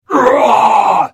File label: Hit; The Elder Scrolls III - Morrowind